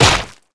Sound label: hit